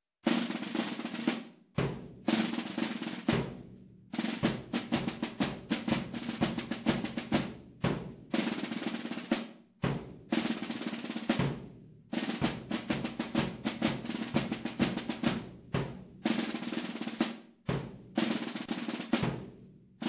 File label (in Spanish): bombera, tambores; Calanda